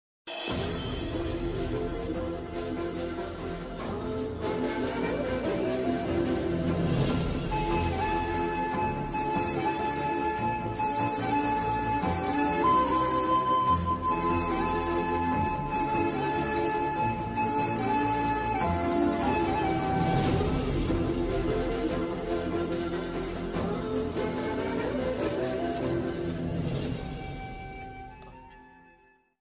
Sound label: love bgms, heart touching ringtones; rakul preet; sarrainodu